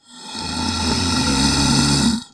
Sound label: Monster death